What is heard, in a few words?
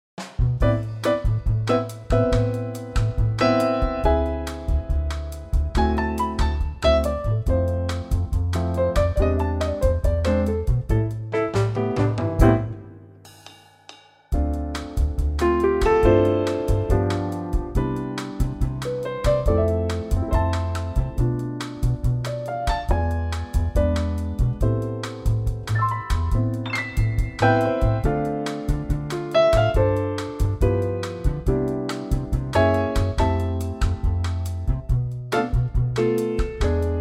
bossa Am trio